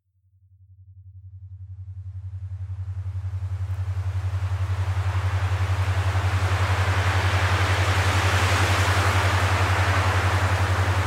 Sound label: Pure Meditation High